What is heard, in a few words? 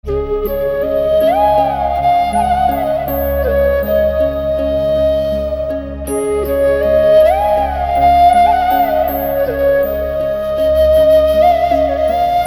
Instrumental